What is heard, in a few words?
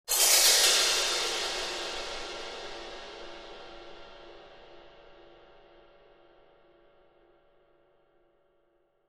Cymbal Accent, drums cymbal